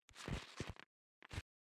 grabbing

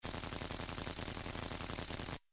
computer caculate sound

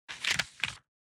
FLIP A page

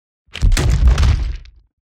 SMACK

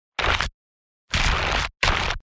sticky movement

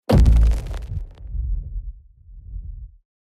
Superhero inbound

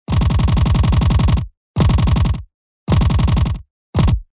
Assault rifle

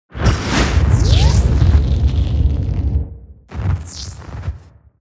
Thunder element

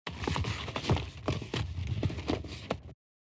fall down

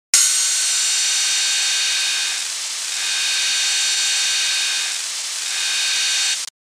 angle grinder

